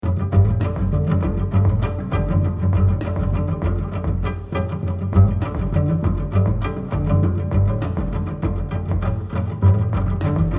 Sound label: music everyday cello